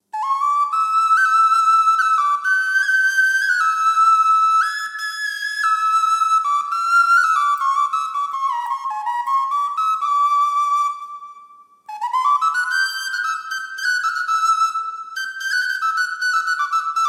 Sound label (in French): Flute duo Sol alto et Do sop